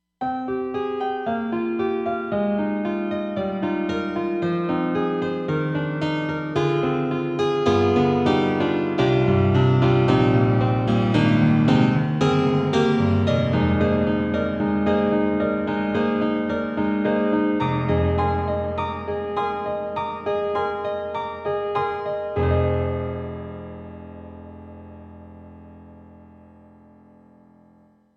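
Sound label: Roland SD-50 Stage Piano 2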